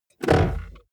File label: car bmw e46 interior center console open hard